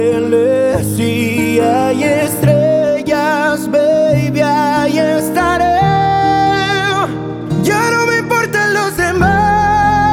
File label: Spanish Version